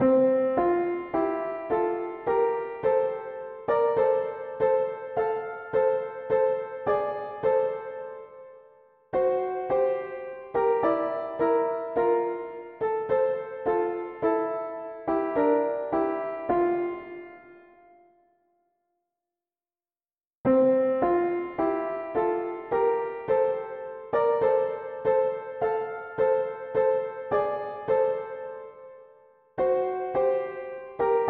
mono piano; Chaque jour de ma vie; hymns

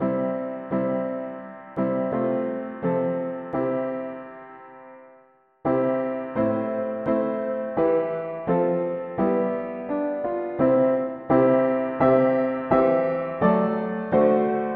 mono piano, cantiques; hymns; Pour toujours avec toi

